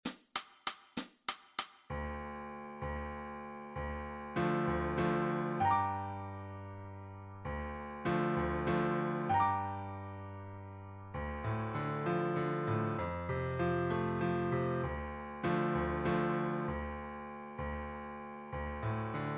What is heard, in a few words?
Backing Track